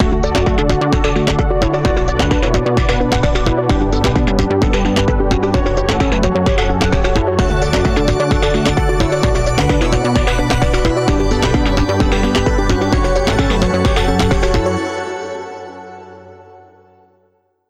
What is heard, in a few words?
Melodies